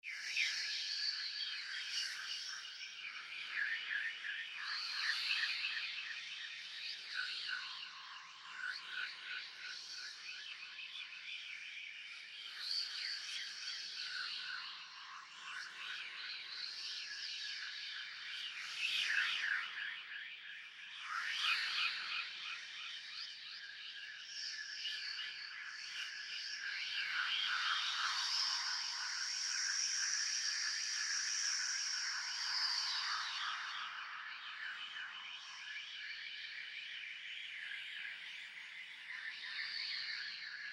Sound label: Atmos Angel Breaths Fantasy, sci fi; Sound Design; Sci-Fi (Sound Effects:Science Fiction); Big Room Sound